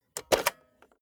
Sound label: cassette player slide tray take out soft